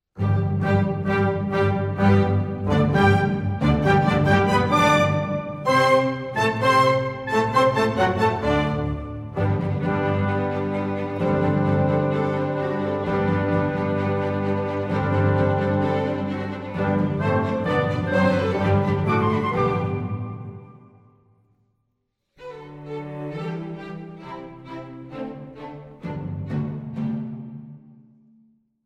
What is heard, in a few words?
piano